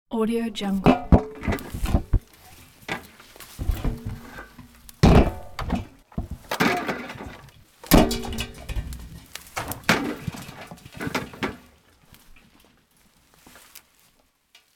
garden rubbish moving; domestic sounds

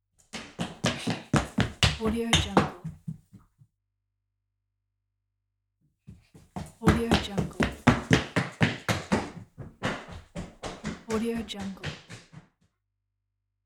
child running in house; کودک نوپا

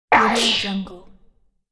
game gurgle paralyze

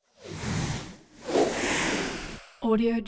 cloth whooshes sharp whooshes; transitions and movement

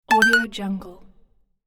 interface arp grandiose; interface sounds

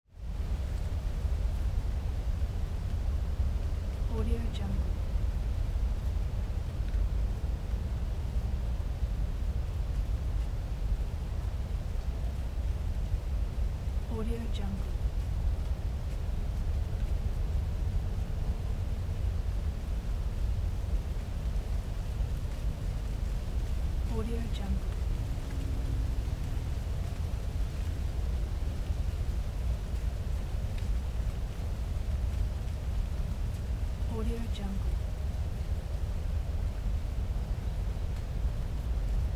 suburban forest hill windy autumn midday

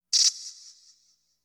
synth cicada hit; industrial sounds

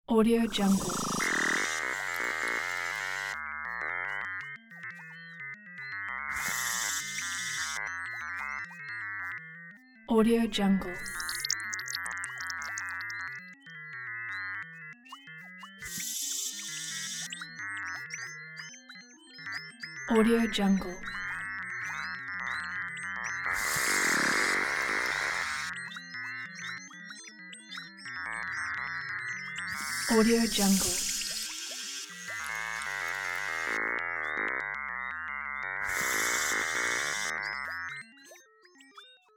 alien laboratory ambience; futuristic

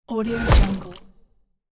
transition impact slap sudden sweep; transitions and movement

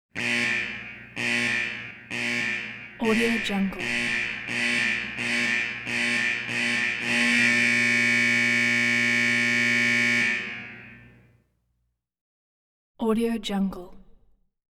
warning buzzer with reverb; آژیر هشدار تکرار شونده; industrial sounds

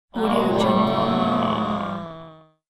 mixed group pain exhausted slow

